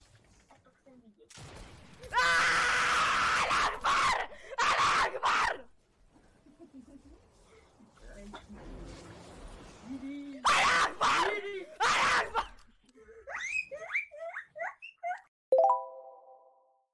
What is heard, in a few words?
Slovak screaming